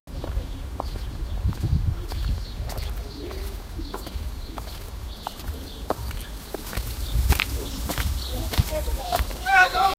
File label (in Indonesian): suara orang jalan kaki di, rural